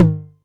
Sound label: Tom Hi, Novation Drumstation